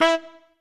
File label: Trumpet melo